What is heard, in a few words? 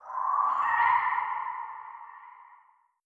idle wet, headcrab reviver; creatures; vox